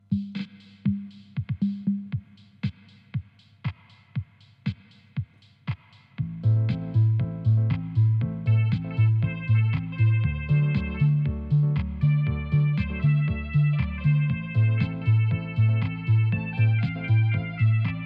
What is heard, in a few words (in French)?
radio rock